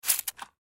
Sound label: Spray Bottle Pull, Spray Bottle Pull Handle Squirt Water Single; Stream Short; Spraying; Blastwave